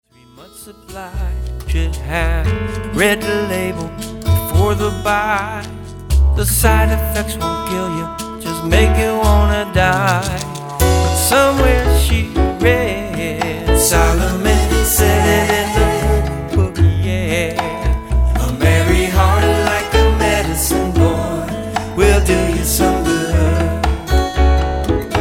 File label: world folk remix